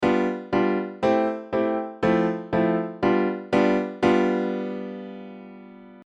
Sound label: Mixolydian prog; Modal Harmony: An In-Depth How-To Guide